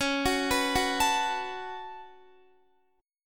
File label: C sharp 7th Suspended 4th Sharp 5th C sharp, Guitar; C#7sus4#5; arpeggiated; JGuitar